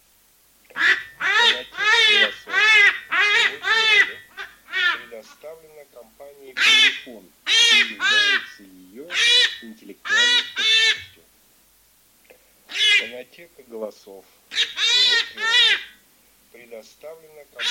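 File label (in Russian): Заяц раненый Lepre Grido, манок; chip mini 29n plurifon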